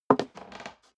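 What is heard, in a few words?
step high heels wood creak single left, footsteps; schritte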